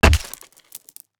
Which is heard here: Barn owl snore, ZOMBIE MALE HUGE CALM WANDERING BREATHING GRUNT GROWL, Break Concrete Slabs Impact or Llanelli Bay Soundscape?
Break Concrete Slabs Impact